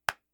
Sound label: Single Clap